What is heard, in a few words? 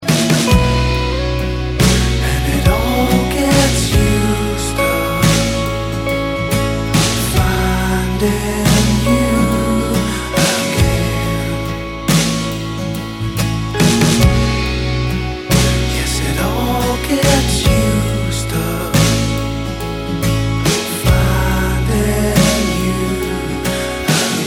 chorus